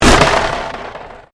Convolution Pulse

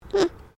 ANIMAL ALPACA BABY GRUMBLE; Alpacas on a farm in Gippsland Victoria; Miscellaneous Animal

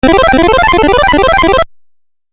8 Bit Style Arpeggio; Video Game Sound Effects

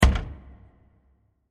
Deep Stab With Reverb; Miscellaneous Impact; Impacts; Sound Effects:Impacts; CraftedSignals

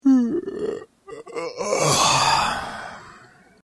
Yawning, Male Yawn; Yawning Sound Effects; human sound effects

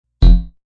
Bass Doing

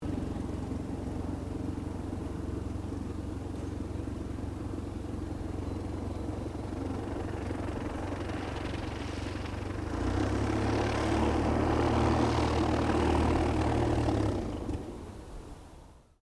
AIRCRAFT RYAN STM-S2 SHUTDOWN; 1939; Airplane; Vehicles

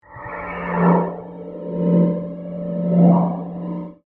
Scanner Beam Ray, Scifi; Several, Passes, Science, Fiction; Tech; BlastWave

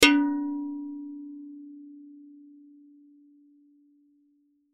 Impact Metal Ring Hit Dull Ring; Sound Effects:Materials; Blastwave

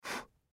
Human Blow Soft; Breathing; Blastwave

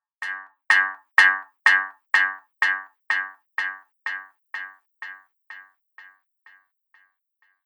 Twang Sound Repeating Delay

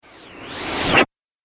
Whoosh, Whoosh Sound Effects; sound design; Swallow This 2

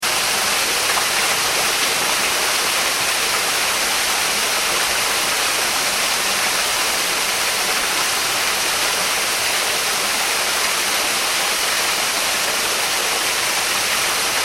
water waterfall small; River; Nature; McKinney Sound

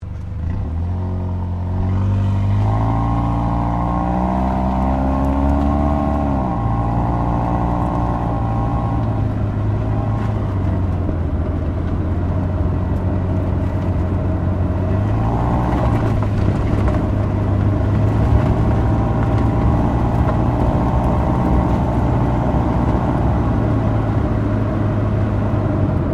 Car Toyota Yaris exhaust drive slowly

